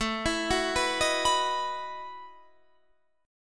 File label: A 6th Suspended 2nd Sharp 11th, guitar; arpeggiated